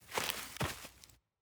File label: Footstep Grass Rotate